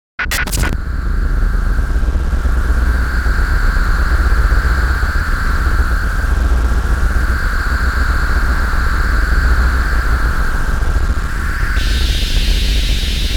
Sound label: Bed Glitch Electrical Surge; 5.1 STEREO MIX; Blastwave